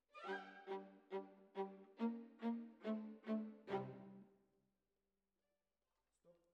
Violas, jazz for piano

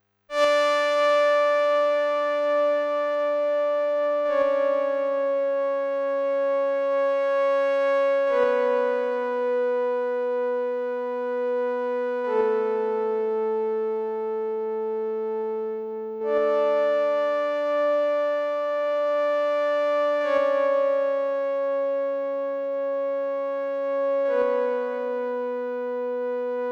Synth

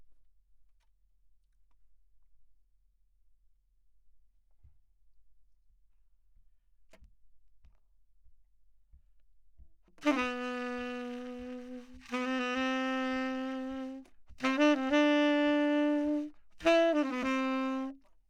Sax

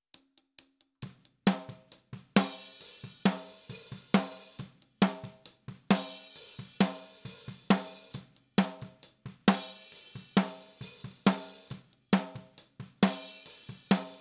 Snare Top

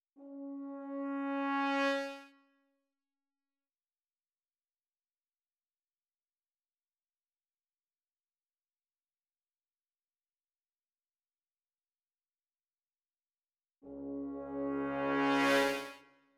brass

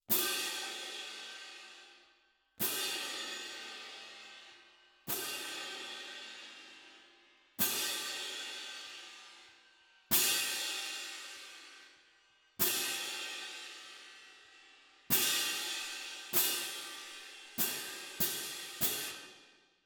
Perc

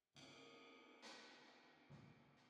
Drums Verb

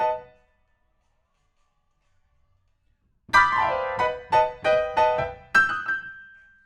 PIANO, RNR for piano

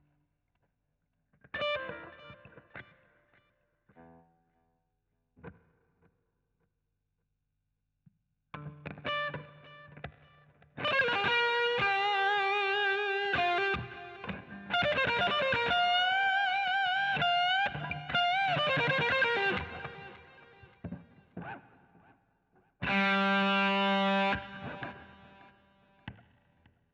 GTR A Solo

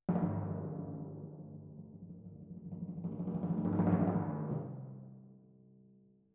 Timp Main

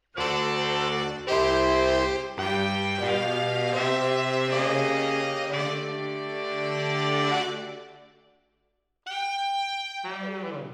MKH AB, piano

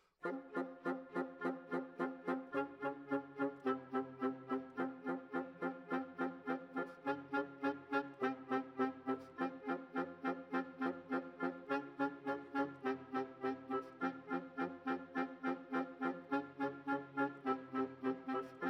Clarinet

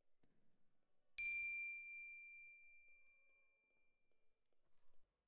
Glock Close, Jazz for piano

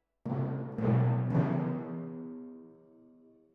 Timp

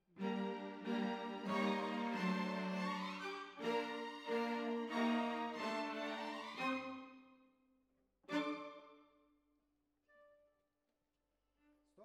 Cellos Over

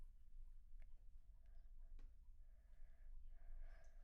Children Choir; Studio Bekol Children Choir

